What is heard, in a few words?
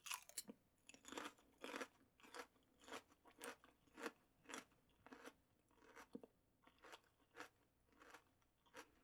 Eating Crunchy Food; Fairlight Sound Lib